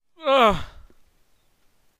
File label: death; Donald